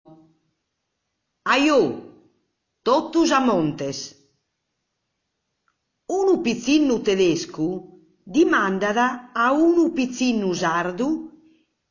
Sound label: Sardinian Orgosolo; Giga Sardinian